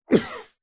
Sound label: human male man cough single into cloth or arm muffled; zapsplat